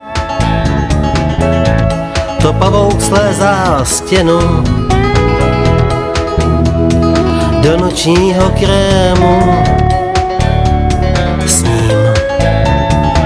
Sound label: digital country